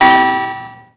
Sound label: slot machine no win; Max Payne